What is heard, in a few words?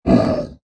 exhale; bloodclot